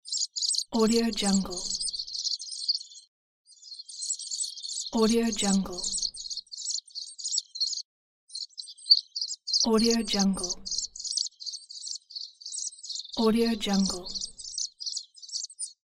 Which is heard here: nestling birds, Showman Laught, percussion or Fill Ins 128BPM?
nestling birds